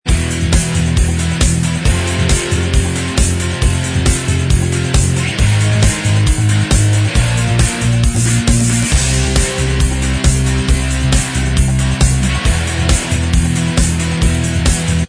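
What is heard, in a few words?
instrumental, backing track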